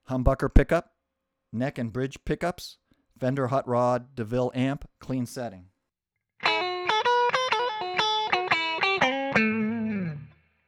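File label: hum neck bridge hot clean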